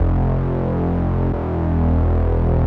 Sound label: Saw Bass After; Slam Pro